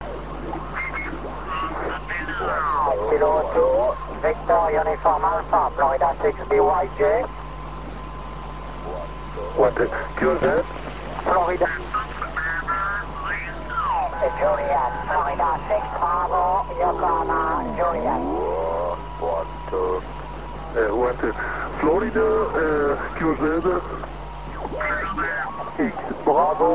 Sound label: ao51 ssb fm